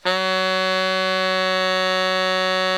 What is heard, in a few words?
baritone FF F#2, Sax; Giga Samples Collection